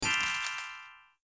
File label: sparkly